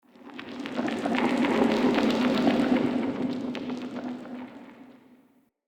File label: Magic Cave Texture; Fey Hub